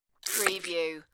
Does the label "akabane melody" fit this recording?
No